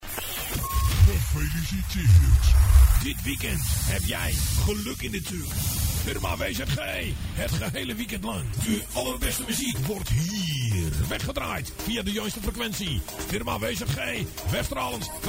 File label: Jingle; Firma W.Z.G.; Audio Fragmenten; Arkan Radio 2